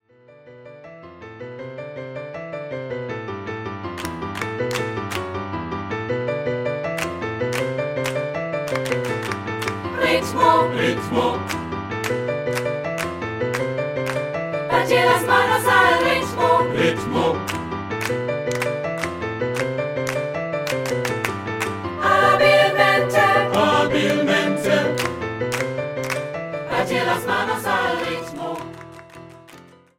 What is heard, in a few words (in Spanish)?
ritmo